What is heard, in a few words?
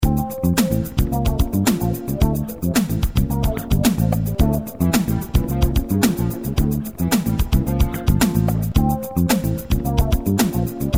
tropical